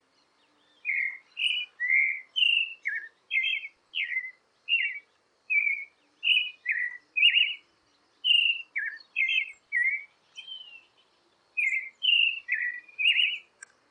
Canto do Sábia Poca

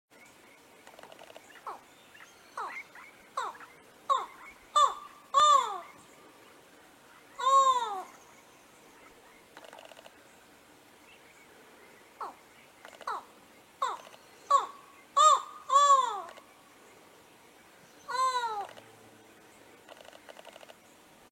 Canto do falcao relogio

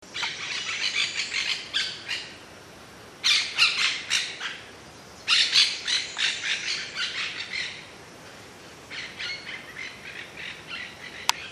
canto da caturrita

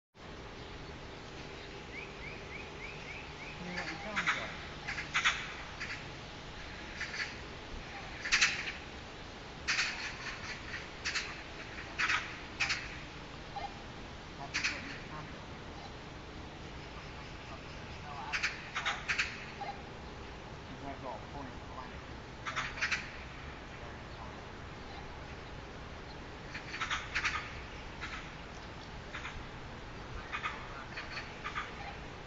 canto do tordo de cauda ruiva

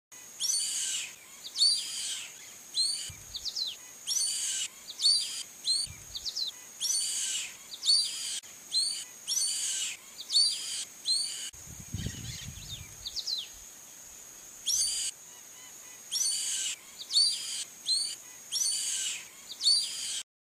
canto do emu

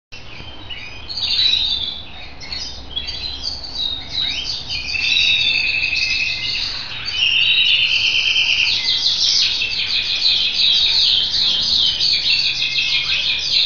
canto do canario scotch fancy

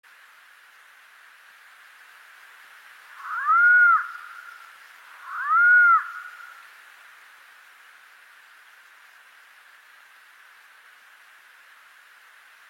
canto do urutau andino

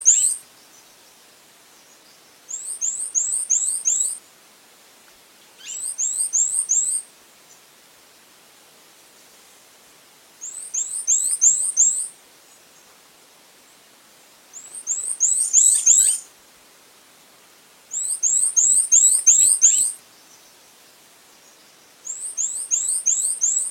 canto do saira viuva

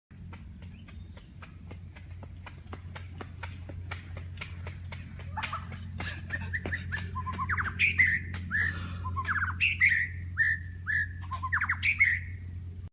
Canto do xama indiano

